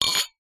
wine glasses x3 pick up one handed clink; household

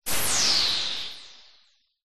magic wand whoosh burst; zapsplat

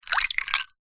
drink ice water glass movement

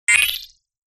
Open; PMSFX

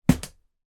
flightcase bash into wall or object; foley

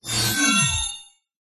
science fiction robot mechanical burst transform; zapsplat

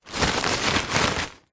leisure kite nylon handling

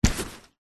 luggage fabric make up bag grab pick up

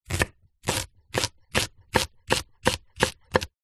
food vegetable chop knife

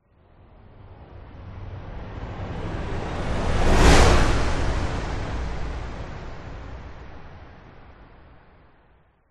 whoosh; orlando lanzini

